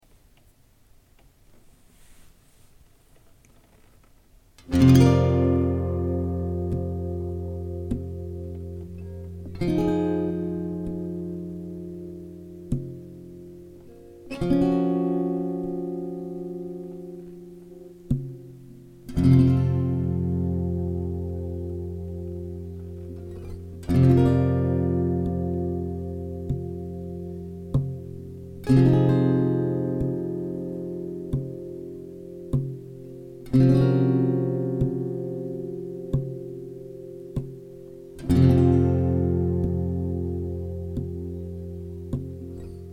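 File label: F 100 guitar chords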